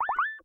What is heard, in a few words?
invite